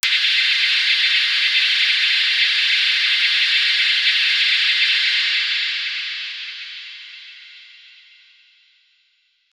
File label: cyberpunk barrier hologra